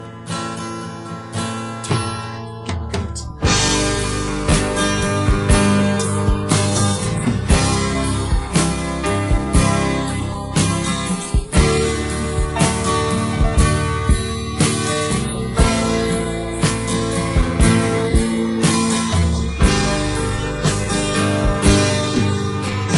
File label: accompaniment